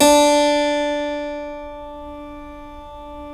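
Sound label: D3 clavinet right, Keyboards Acoustic; USB Soundscan vol.17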